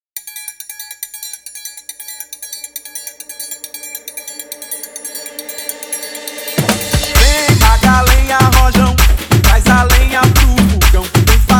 intro outro 130 bpm

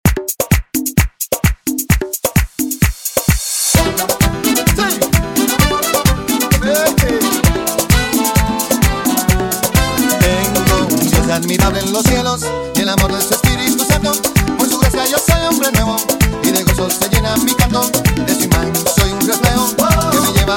130 bpm

